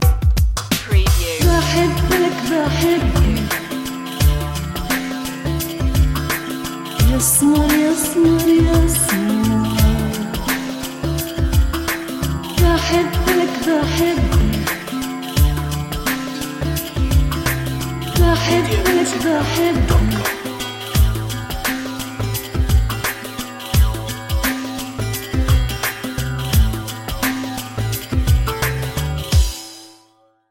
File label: electro world wide